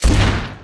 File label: attack; boswars-2.4.1; bazoo